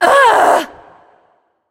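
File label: ugh, female; smite; voicepack; hera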